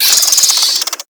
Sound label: Infested Minion Pain; Warframe